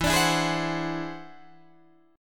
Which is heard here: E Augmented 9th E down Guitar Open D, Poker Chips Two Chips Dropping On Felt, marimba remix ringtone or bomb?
E Augmented 9th E down Guitar Open D